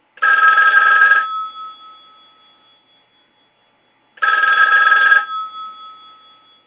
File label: old phone